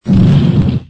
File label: Block Drop; disney; big crane game